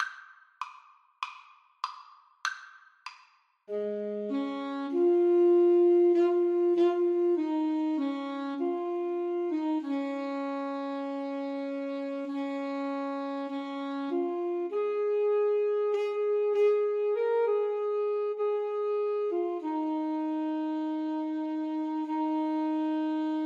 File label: ASAX DUET; Alto Saxophone Duet